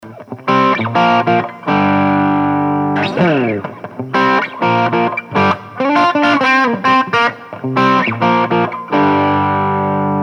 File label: Tech 21 crunch